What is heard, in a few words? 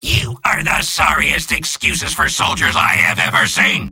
jeers